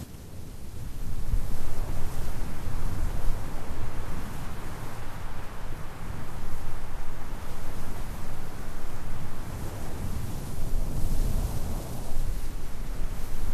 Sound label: Combo Wind; server-nalc; ambience modpack